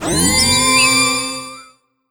Skill Spell; Imja

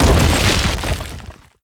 Props Road Destroyed

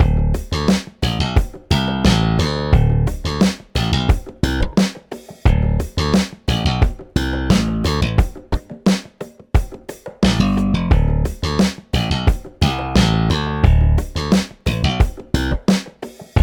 Funk; Rhythm Doctor

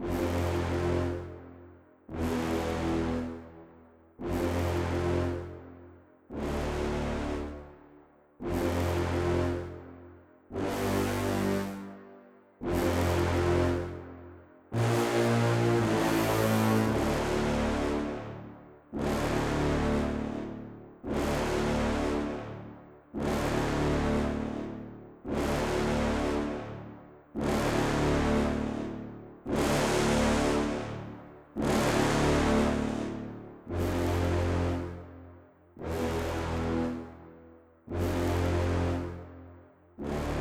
Loop

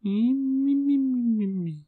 snore end

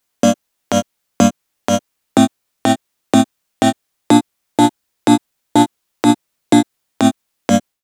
break 16 beats

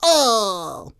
Blow Pipe Assassin; gamerip

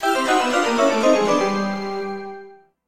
Clear Fail; In Clear Fail

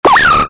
player damage; starbites

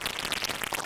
Glitchy Worms Loop

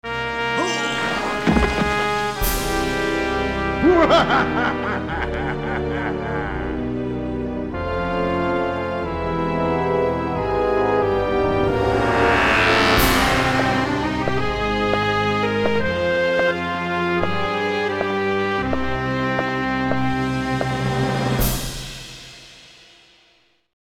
Gameover; Inspector Gadget; Gadget's Crazy Maze; PS1

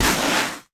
Footstep Skid

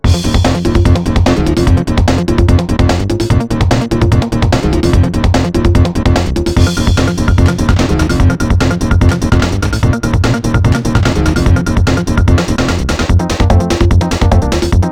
Jungle Falls Fast

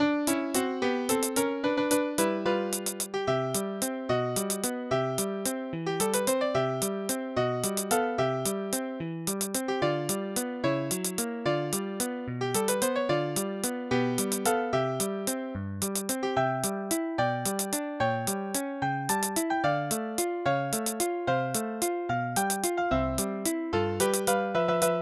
Underwater Theme; bart bash

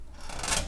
Fence falling

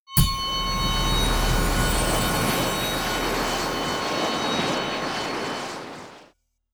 Room Transition

